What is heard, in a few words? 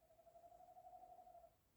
night bird